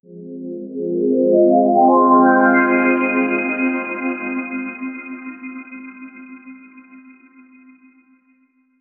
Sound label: sci fi warm, electronica